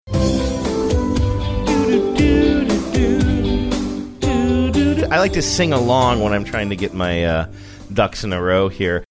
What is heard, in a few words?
singing along with theme saying i like to sing along; doogie howser md